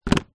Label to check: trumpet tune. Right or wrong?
Wrong